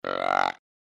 Buck Grunt Small, Deer; Dave Kelso

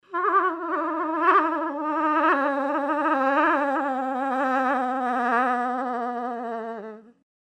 Cow In Heat Long, Moose

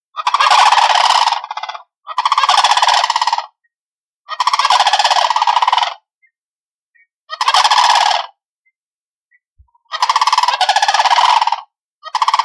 Sand hill crane; ORION; Birds

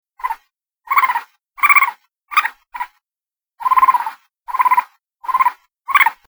Raccoon Pup; ORION

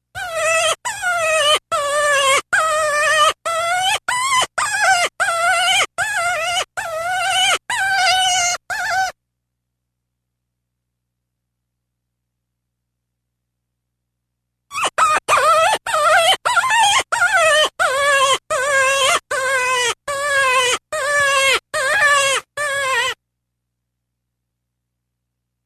Cottontail, Rabbit

